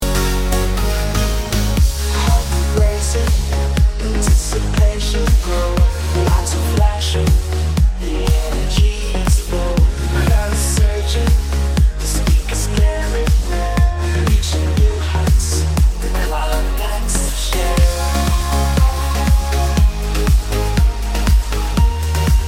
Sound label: edm